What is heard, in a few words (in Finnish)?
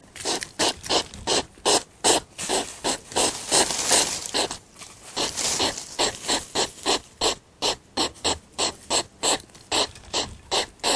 siilit